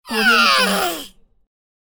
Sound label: cartoon character pain yell